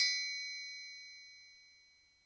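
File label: march triangle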